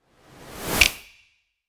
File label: Cinematic rise and snap; logo animation